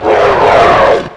medic pain; rogue; medic commander

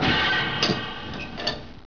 fall

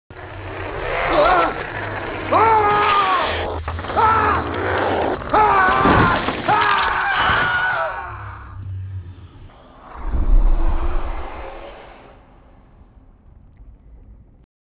mist scream

